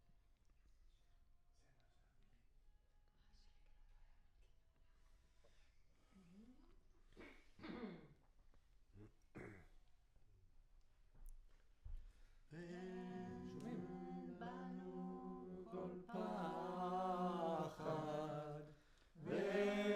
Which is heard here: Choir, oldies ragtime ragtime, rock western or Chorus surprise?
Choir